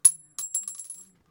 Bullet Casing On Concrete

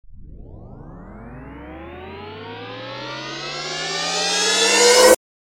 Crash Transition

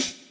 Semi Snare

